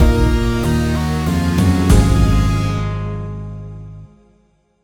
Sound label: Victory; Rook's Keep Online; Desecrate